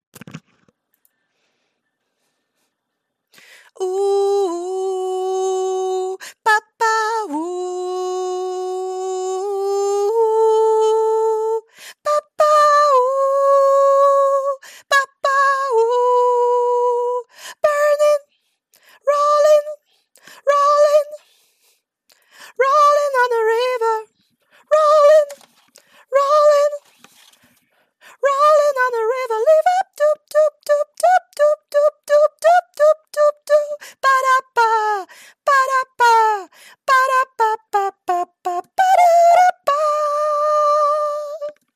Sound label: soprano1; Voix Soprano1 (TT); L’intro de notre chorale, qui lance chaque concert.